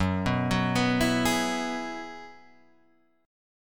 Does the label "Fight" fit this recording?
No